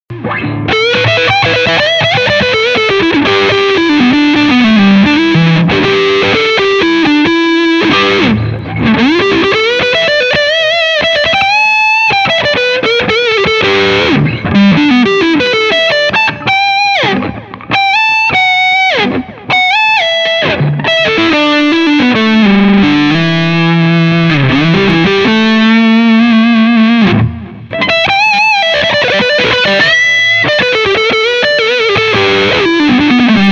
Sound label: Tango Lead Chanal Gibson Solo